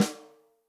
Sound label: ROCK SNARE CHROMATIC, Real Drum Kits